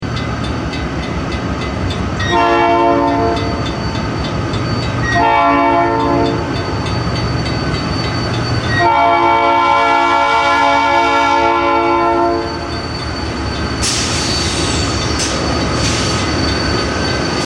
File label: horns; SSW8065